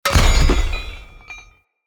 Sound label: Bird Repulsor Destroy; Objects; Thawtide